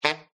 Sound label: Sax Honk